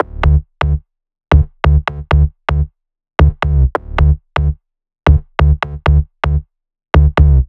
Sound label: 128BPM Melody; Vengeance Samples; Emote Kit